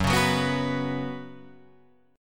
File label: F sharp Suspended 4th, Guitar, Open F; strummed; F#sus4